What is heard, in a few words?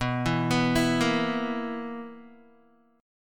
B Major 7th Suspended 4th B down Guitar; arpeggiated